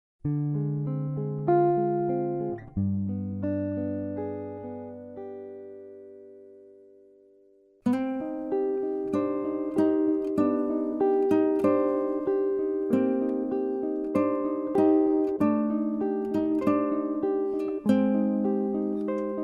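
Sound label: Jazz melody; MyVirtualBand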